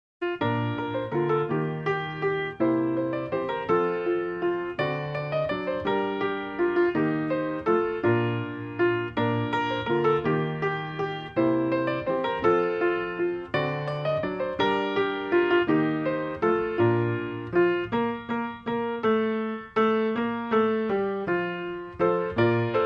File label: We Wish You A Merry Christmas, Solo Piano